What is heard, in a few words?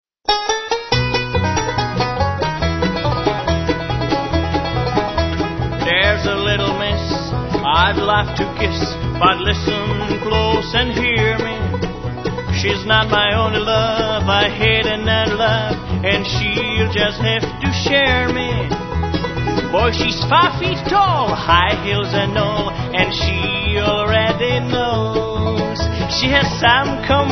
Polka On The Banjo